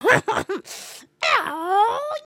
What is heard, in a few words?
collapse; emotes